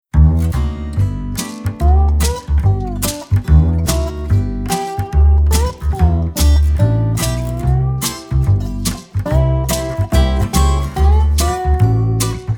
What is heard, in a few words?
Instrumental